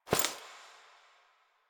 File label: Body Move